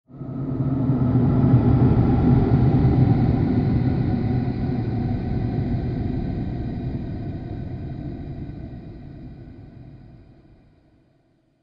Passing The Asteroid, science fiction

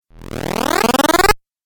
Rising Video Game

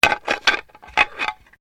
Bones Human Move

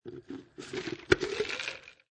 Drink Open

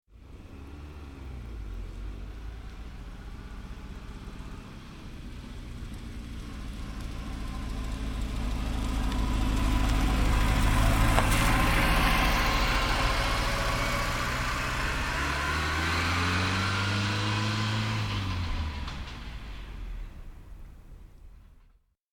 Exterior - reverse pass left to right, Peugeot; Peugeot 25; Cars; BBC

